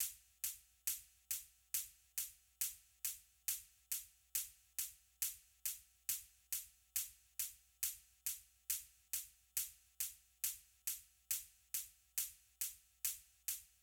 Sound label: Closed Hats